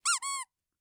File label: Squeak